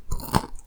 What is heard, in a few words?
soft chew; dfcaverns; farming